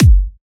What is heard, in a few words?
Monster Kick, Drums, Kicks